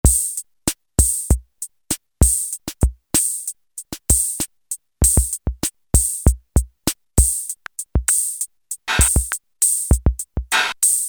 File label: CR-78 kit; Roland L-CDX-01 Rhythm Section Instruments